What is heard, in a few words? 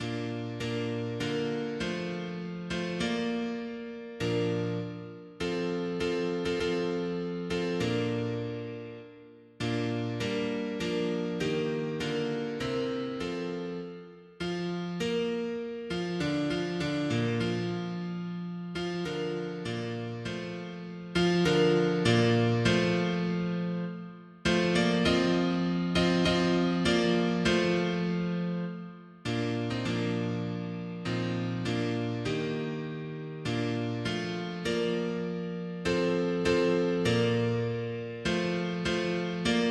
mono piano; hymns; Himnario grande